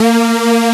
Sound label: Aud Synth A#2; Digital Sound Factory Xtreme Lead